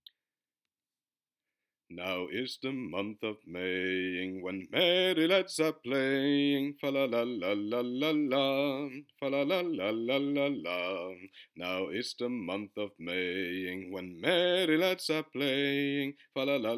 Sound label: bass; Maying